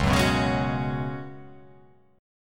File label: C sharp 7th Suspended 4th C sharp